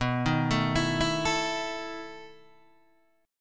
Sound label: B 11th B Guitar DADGAD, chord